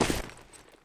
foliage, footsteps; player

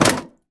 container damage; Counter Strike Model Pack; CS:GO; survival

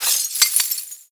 debris glass, bullet impact debris

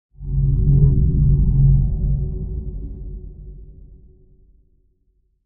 whoomp, synth; ambient; Portal 2

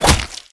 attack hit, zombie; creatures

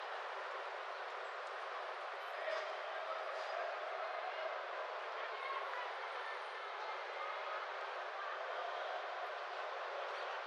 distant city; ambient; dust2

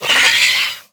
scared, headcrab black; creatures; vox

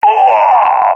balkan death; vo; balkan epic

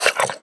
breath in, headcrab

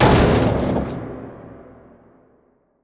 stomp sheet metal, antlion step

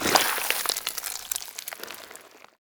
squeeze, headcrab gland; creatures; headcrab classic

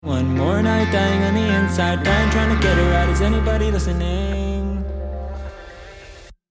lost round, music

